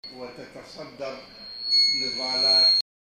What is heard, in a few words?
loop